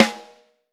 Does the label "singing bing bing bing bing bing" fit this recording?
No